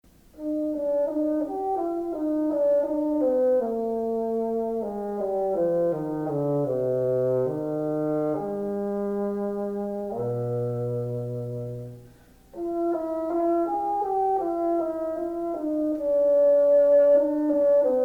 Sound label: bassoon